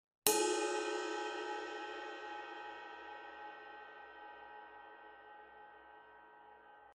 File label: Paragon Ride bow, ride cymbal; 22; Signature Neil Peart; Sabian